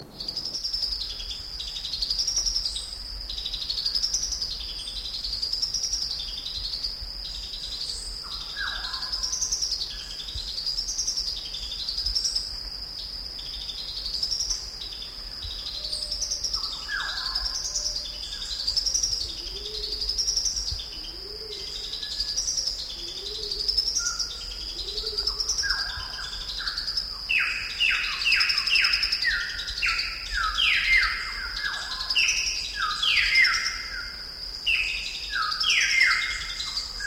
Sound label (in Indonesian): burung madu sanghie